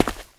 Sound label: Footstep Dirt; Guard